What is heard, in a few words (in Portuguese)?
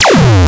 laser agudo